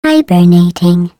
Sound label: Lock Chime; Portal Hiberbating